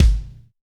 short reverb bass drum single shot g sharp key

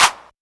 clap one shot D key; percussion; single hits one shots

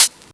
soft sounding foot cymbal f key

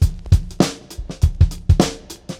101 bpm drum loop sample e key

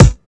good steel kick drum sound G sharp key

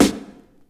verby snare drum sample b key; snare drum samples

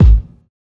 deep kick drum single hit g sharp key; Duration 0:01; Samplerate 44100 Hz; Bitdepth 24

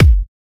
mid range kickdrum sound g sharp key

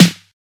dark sounding snare f key; snare drum samples

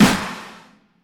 fluffy acoustic snare sound g sharp key; snare drum

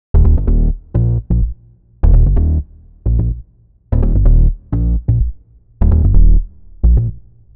Techno Shame Bass; bass loop

